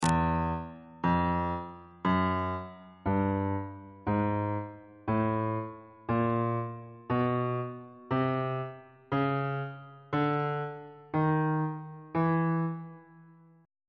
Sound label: f flat dorian mode chromatic scale 1 octave on bass clef